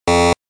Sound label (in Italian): suoneria beep